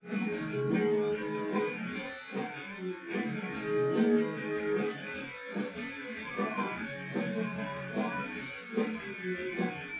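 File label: with drums; SiSEC10; underdetermined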